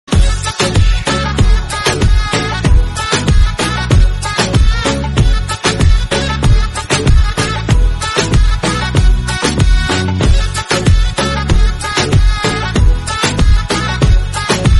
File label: music fast, bgm